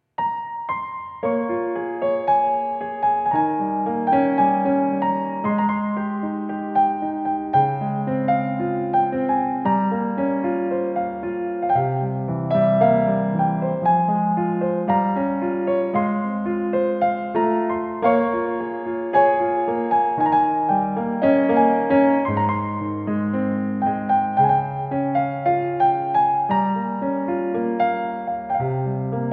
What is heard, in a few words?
Piano